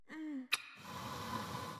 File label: npc strike; Shelter